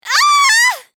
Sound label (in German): schrei weiblich